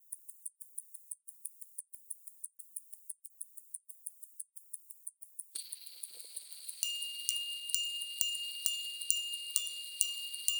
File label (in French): sonnerie souveraine binaural; fpjourne